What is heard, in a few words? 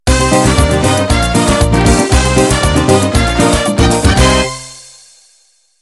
Gypsy Pop End